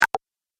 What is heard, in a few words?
Game Pause, video game